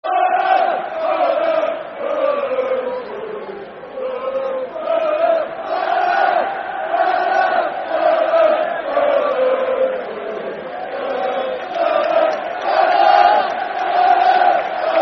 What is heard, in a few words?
Fulham, Fulham, Fulham, football chant, soccer songs